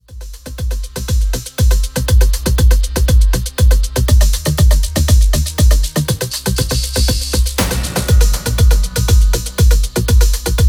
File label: Trommer Metalized